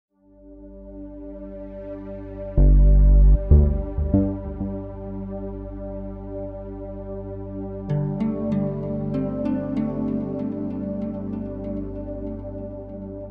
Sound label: Inspection -Jingle-